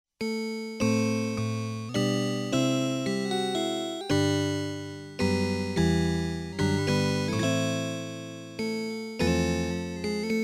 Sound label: Casio Guitar; trebuchet-xmas